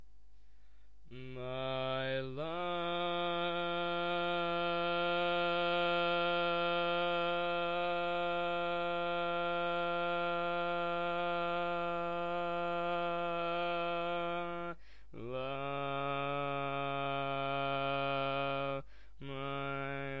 Bass

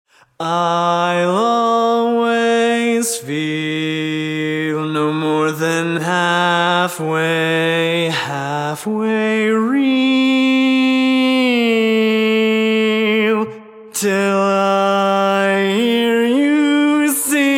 Bass, barbershop

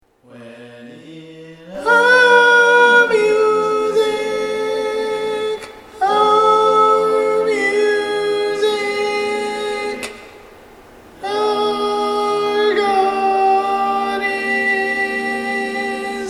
barbershop tags

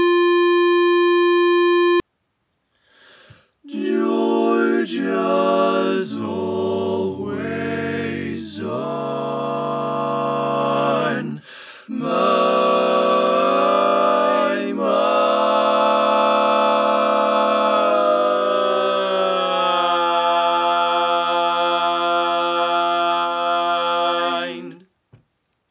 Bass, barbershop tag

